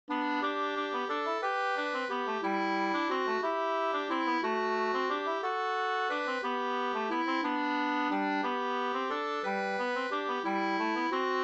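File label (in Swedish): vals Brudvals, two-part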